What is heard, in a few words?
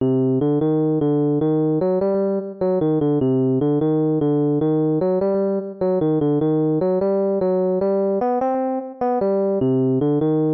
Lydian b3 experiment